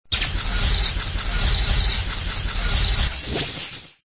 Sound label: bolt